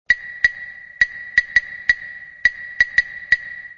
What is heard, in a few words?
CLAVE, claves